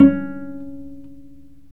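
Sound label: strings violoncello pizzicato, Chamber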